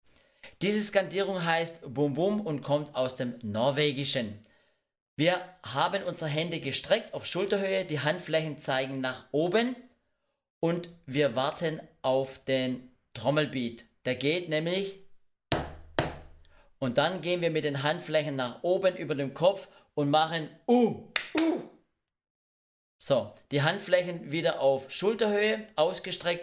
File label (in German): BOUM BOUM UH Skandierung